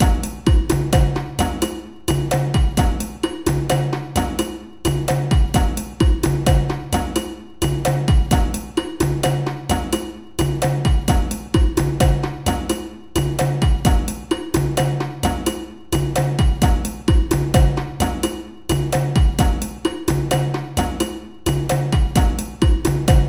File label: Kakilambe Groove 130, djembe